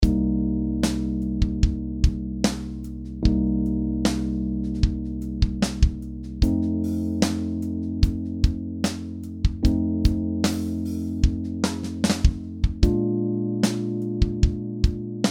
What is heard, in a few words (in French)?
Backing track progression ii V I Bb majeur